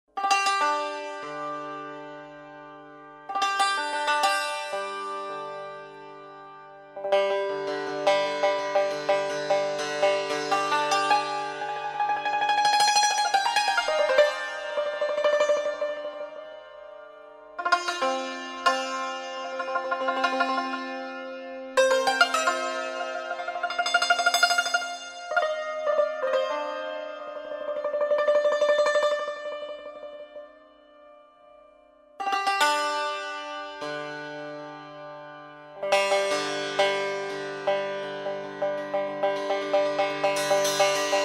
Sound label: Bayat Tork Improvisation